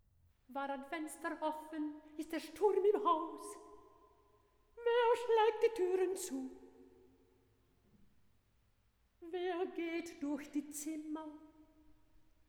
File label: CORNET